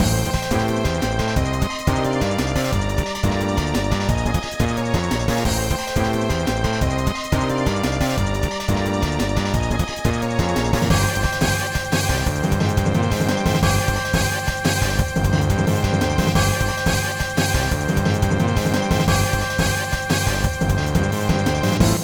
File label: Key Trial - Hurry Up; bomberman 64 second attack